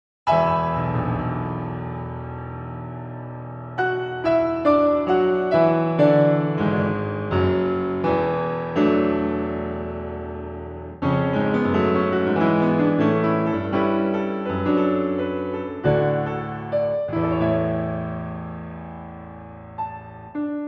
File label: Solo Piano, Fantasie; Tosca; Paur